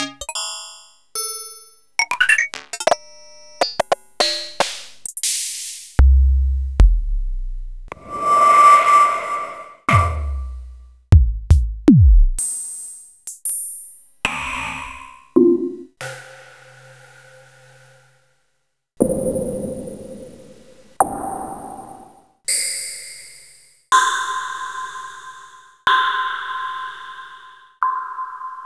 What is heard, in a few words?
Korg Z1